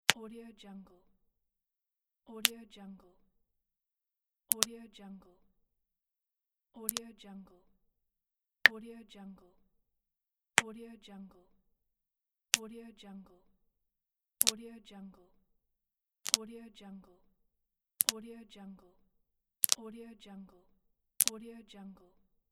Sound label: finger bones crack